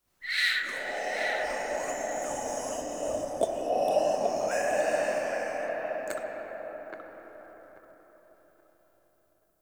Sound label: Room Whisper; Ambience; Dante Unbound; Warframe; Entrati; Dead End Secret